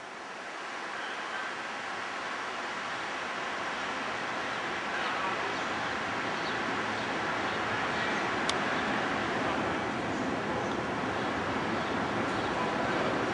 Rufus-sided Towhee

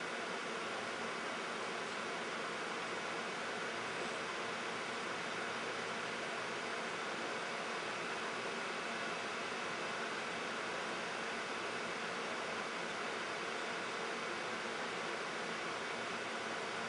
hawk RT my office

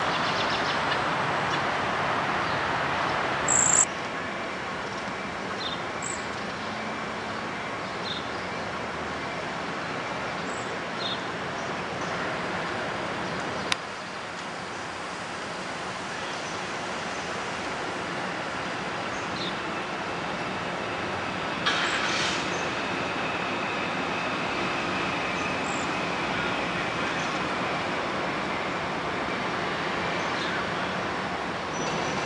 cedar waxwings

